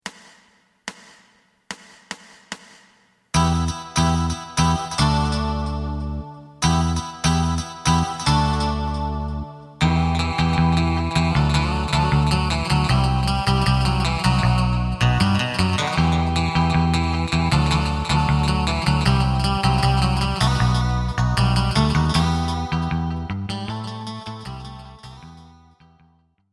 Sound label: drum